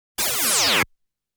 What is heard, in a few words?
Option Equip Sound; 1500 series collectors box toaplan 1993